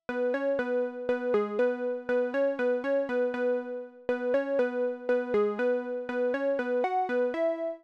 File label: Synth